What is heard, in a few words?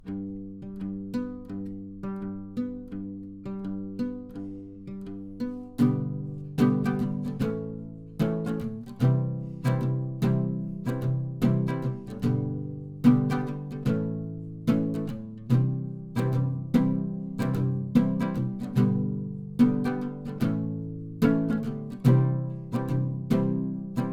Wide bridge